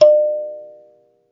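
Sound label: KALIMBA E5; ILIO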